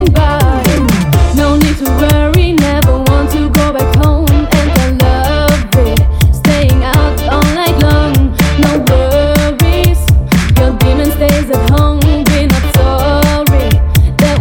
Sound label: Funky Disco Remix